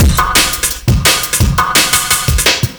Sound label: Terrain Break; Jungle; Breaks; Blu Mar Ten - Jungle Jungle - 1989 to 1999 Samplepack